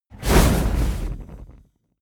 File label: whoosh fire ignite small